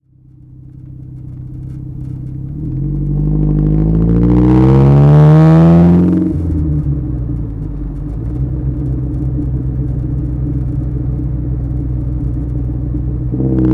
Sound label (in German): alfa romeo spider weber doppelvergaser tal fahrt auspuff